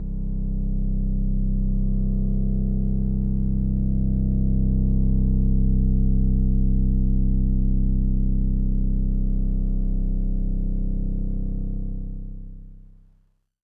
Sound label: Overlay Drone